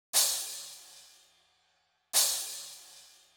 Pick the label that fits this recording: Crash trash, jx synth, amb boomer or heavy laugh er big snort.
Crash trash